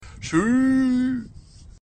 "suuuuui"

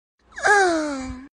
moan

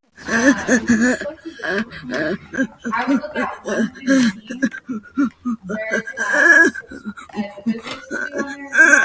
groaning

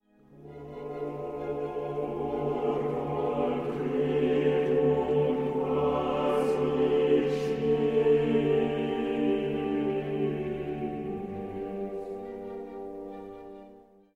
somber choir

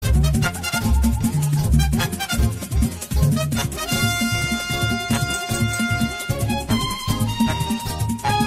samba; dbz

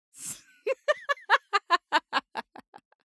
lady laughing funny

